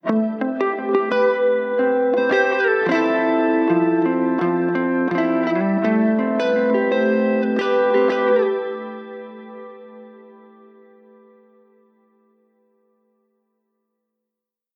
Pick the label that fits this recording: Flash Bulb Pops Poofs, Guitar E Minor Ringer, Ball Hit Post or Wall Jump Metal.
Guitar E Minor Ringer